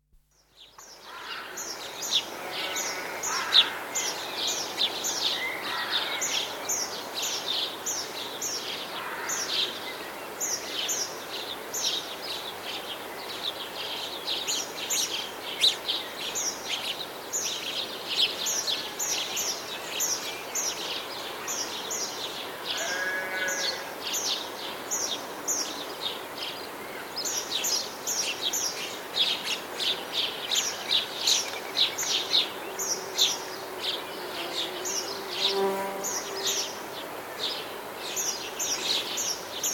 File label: Summer afternoon atmosphere in farmyard with house sparrow calling mid distance, rook collared doves, sheep, insects; Outdoor Sound Effects; BBC